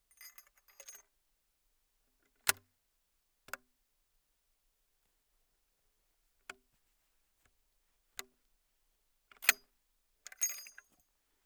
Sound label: Lynx 50 - Shell Ejections, Sniper Rifle; Lynx 50 Cal Sniper Rifle; Pole Position